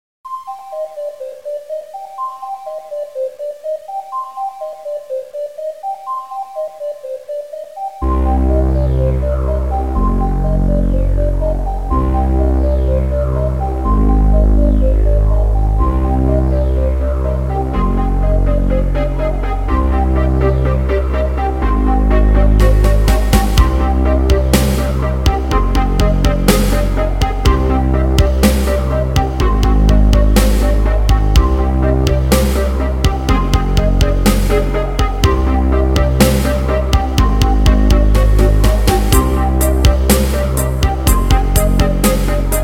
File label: bg music; sven